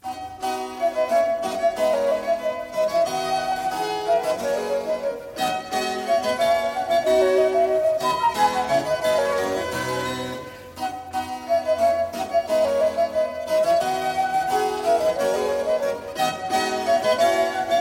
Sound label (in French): Suite en Ut majeur pour flute, dessus de viole et clavecin, Rondeau; Suite No. 1; Marais